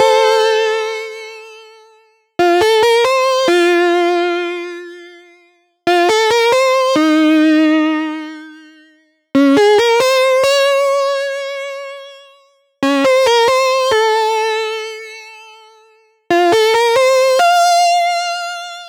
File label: 138BPM Mainlead DRY, F major; Melody & Synth; Vengeance Samples; Another Day Kit